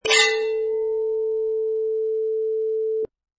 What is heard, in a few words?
Swords Sing